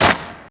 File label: gravel; PC Gamer IT CD 38; thief